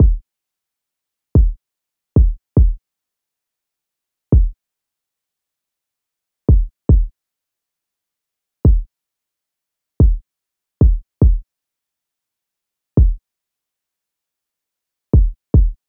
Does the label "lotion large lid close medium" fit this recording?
No